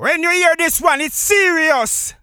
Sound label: mono; TeensyVariablePlayback